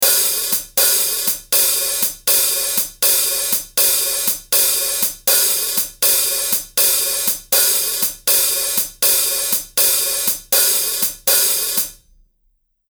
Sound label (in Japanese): Jazz; Jazz講座用DEMO